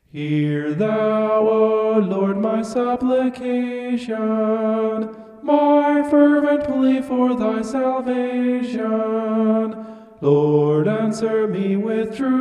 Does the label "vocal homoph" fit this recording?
Yes